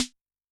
Ana Dark, Yamaha DTXpress-II snare

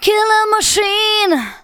VOCALS SUNG; Techno Trance Essentials

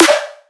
drum snare flashy; Virtual Riot; Drum Hits

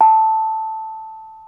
BONANG, Bronze Gamelan

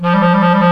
THE CLARINET

